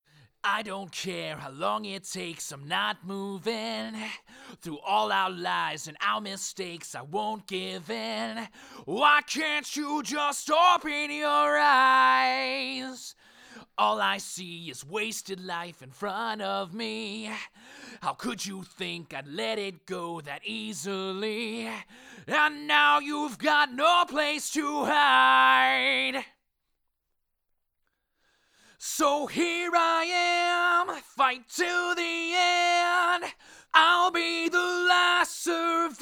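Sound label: Male Solo, Slate SM7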